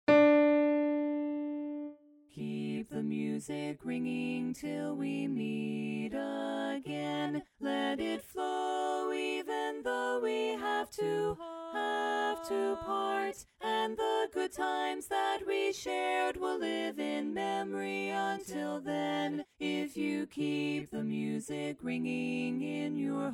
Bari Dominant

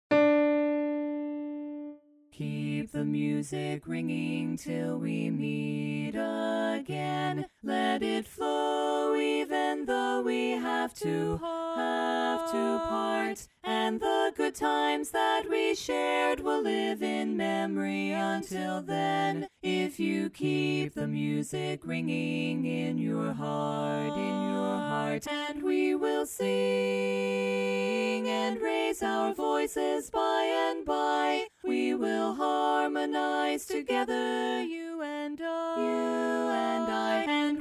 Tenor Missing

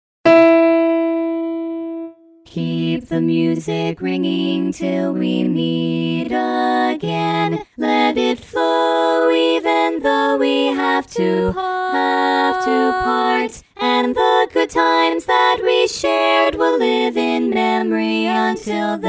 Tenor Missing

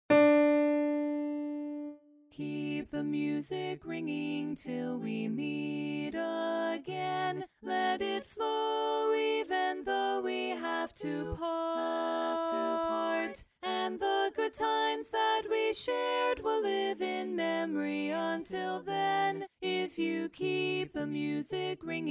Lead Dominant

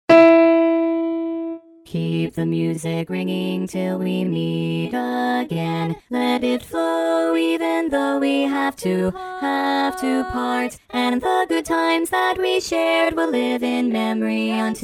E Bass; Bass Dom Fast

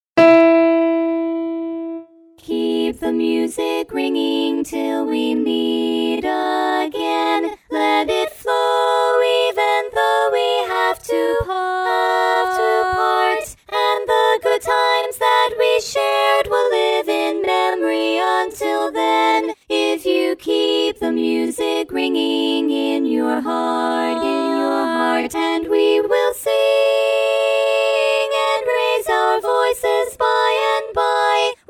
Bass Missing

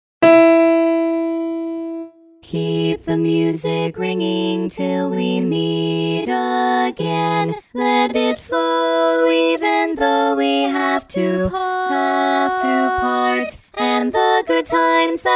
Bari Missing

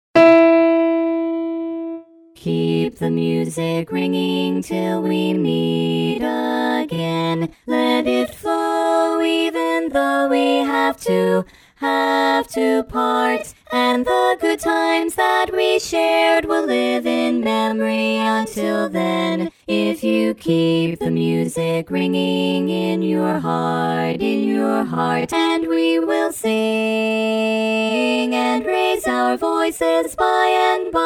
Lead Missing

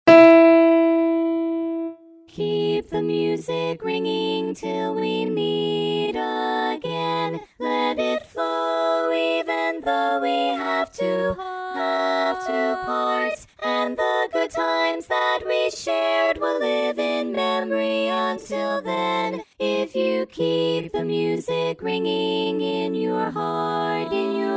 E Tenor; Tenor Dom

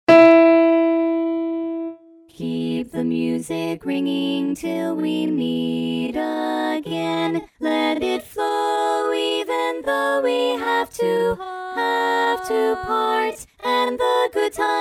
Bari Dom

